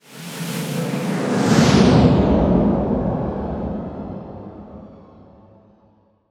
fx spirits escape

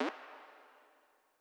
fx oneshot siren beeps verb; Deep Space Textures

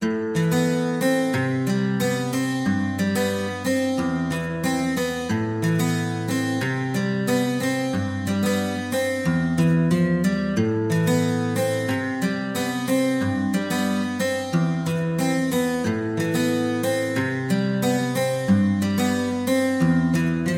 Acoustic Guitar Loop 91 bpm Key Am

